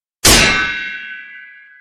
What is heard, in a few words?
Deflect; Sekiro